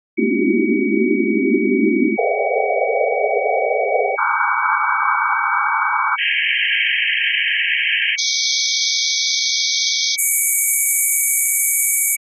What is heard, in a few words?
masking bandnoise maskee